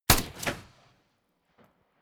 rifle ak-47 exterior fire single bright; comedy-cartoon; Pistols and guns; pistolen-gewehre

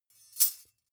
midsize metal thin clank catch bright

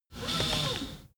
car seatbelt release fast

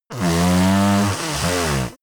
yeti nose blow long

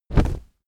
step monster step heavy

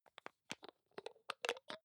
hygiene small screw cup open soft; haus-garten; badezimmer

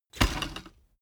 drop metal and wood soft small

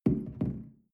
shell place on tablecloth soft; berufe; gastronomie; soundpool

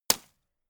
gun shot silencer

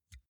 pull out xlr extension slow; objekte; elektronik

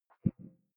click big bubble damped, signale

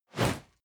whoosh classic

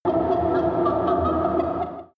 extrinsic fauna, energie

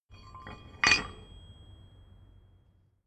metal drawbar handling

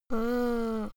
little girl dreamy; soundpool

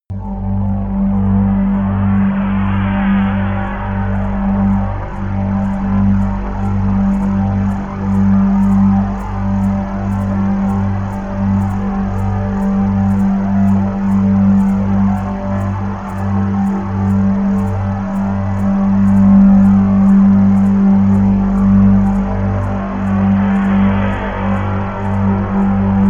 bass and shaker, pads; flaechen

